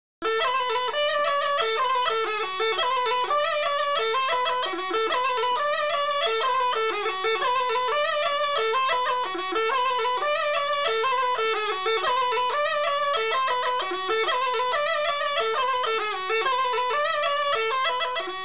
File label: Ruchenitsa Line, Gajda; Ruchenitsa Y Line#5; Lesson Recordings; Vasil Bebelekov (2008) Gajda Lesson